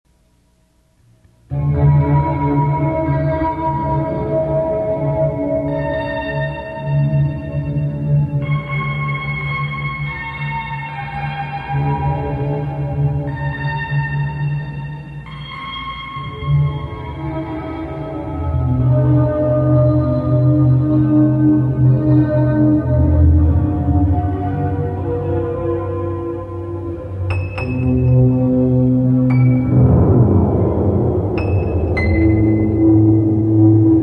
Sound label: Instrumental